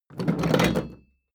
metal box roll smooth; maschinen; soundpool

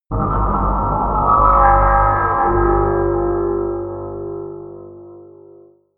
scrapes tensing scariness, drones

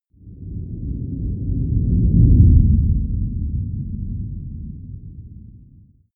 whoosh low slow

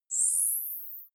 navigation point

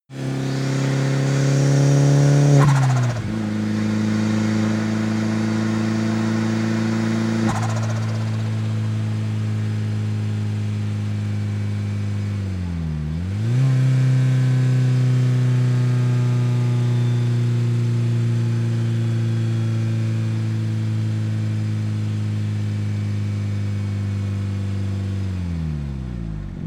car donkervoort d8 gto engine brake high rpm

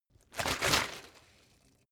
bottle water sparse shake single; berufe

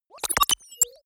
confirm bubble; maschinen; signale; soundpool

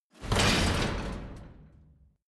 metal chain stack drop

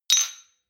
shot glass clink hard, gastronomie; berufe; soundpool

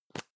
plastic can lid screw open medium; objekte; dose

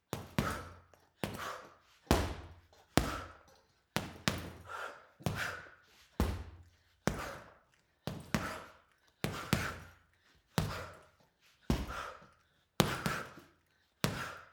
boxing punching bag boxing sequence female breath; sport-freizeit; boxen

